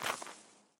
grass

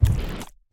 stomp

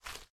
Rustle

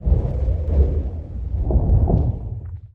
wall rumble; Lethal Company

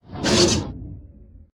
Take Items

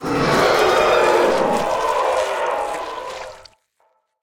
Tentacles Attack; Lethal Company

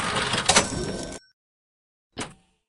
Cash Register Ding; Lethal Company

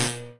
Bee Zap

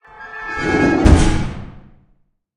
Garage Door Slam

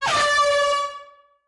AirHorn; Lethal Company

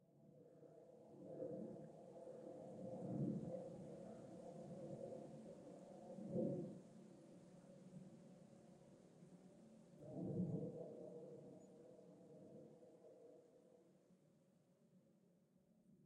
Droning

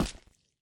Gravel Wet; Lethal Company

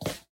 skip walk; Lethal Company

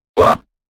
Mic

